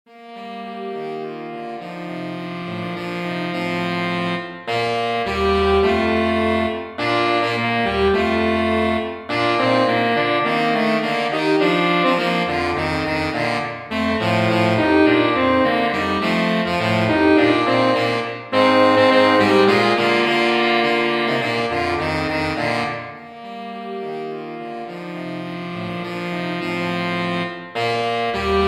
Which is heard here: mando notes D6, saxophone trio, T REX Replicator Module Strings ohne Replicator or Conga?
saxophone trio